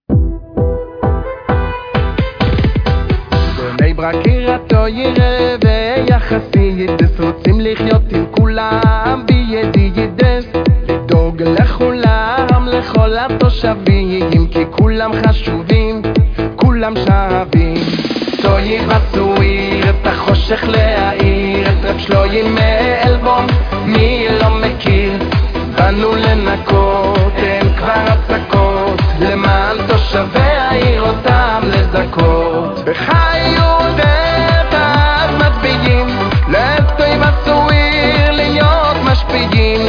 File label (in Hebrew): גינגל; טובת העיר